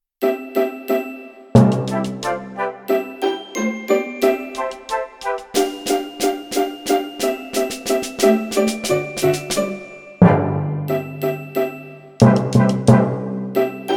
Jingle Bells Intermediate Version

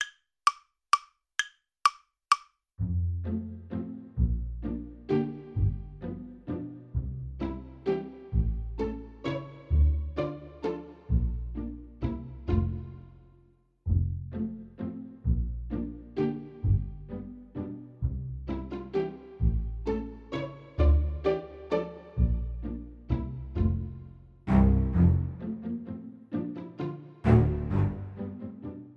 We Wish You A Merry Christmas Playalong slow

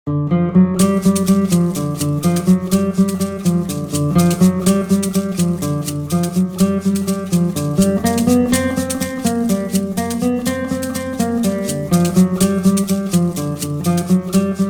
guitar

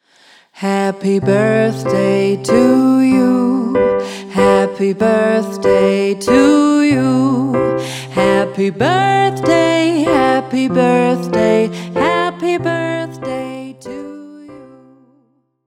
Happy Birthday To You, Pop Piano; Pop Piano Patterns

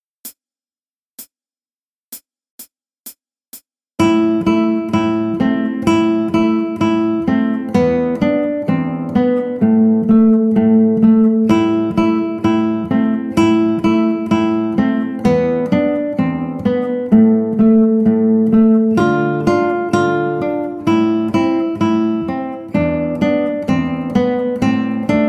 Sascha, guitar; Play Guitar Together 1; Trad. Ukraine

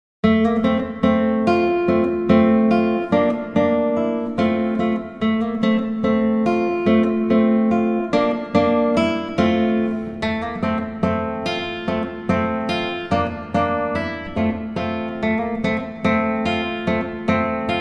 Valse, Guitar; Play Guitar Powersteps 2

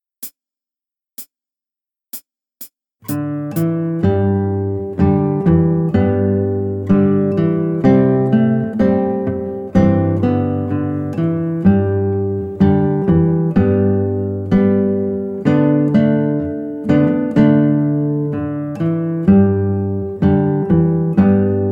Come with me, guitar; Play Guitar Together 1

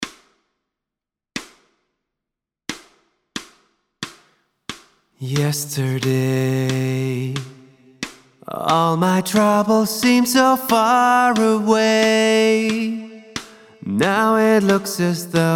Pop Piano

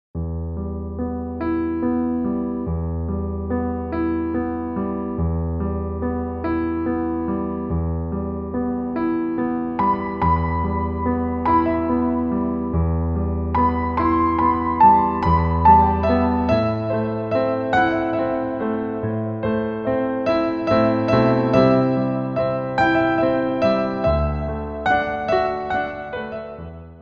Pop Piano

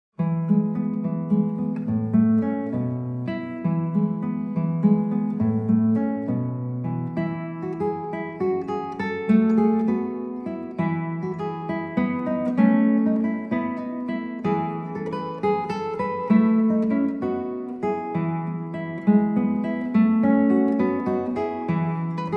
Canario, Guitar

